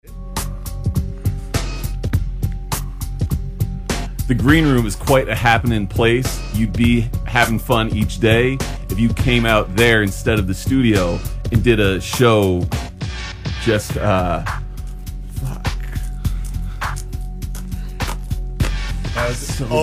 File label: rap battle